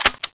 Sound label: railgun clip out, guns